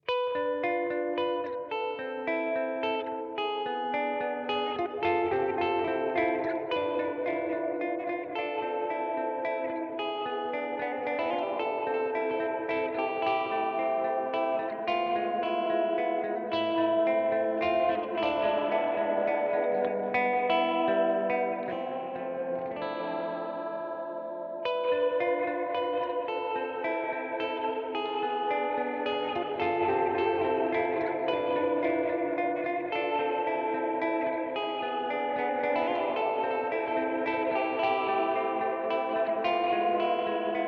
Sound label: Modulated Reverb EHX Oceans 12